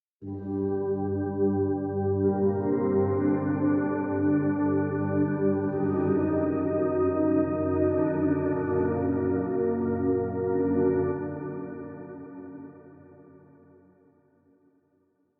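pre final boss lowkey head 87 5bpm G minor 24 beats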